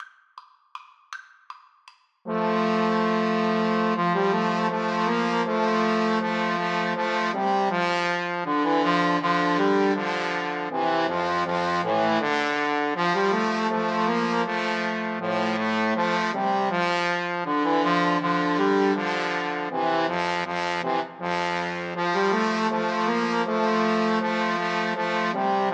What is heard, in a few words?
Trombone Trio